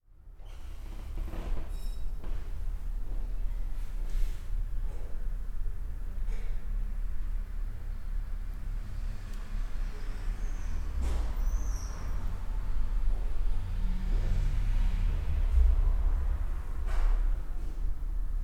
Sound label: street art gallery, sergiyev posad; shlyakov street art gallery